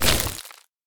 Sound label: icebolt hit; arivan